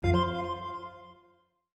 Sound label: Windows Vista Error